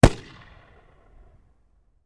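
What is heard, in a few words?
firecracker outside explosion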